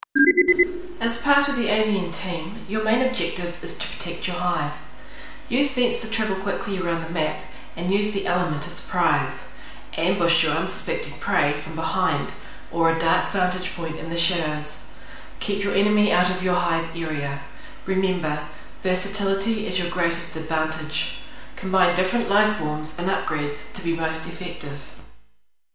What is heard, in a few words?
vocal